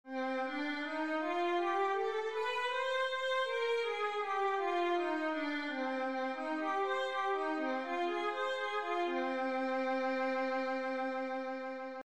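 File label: violin g minor, scales 1 octave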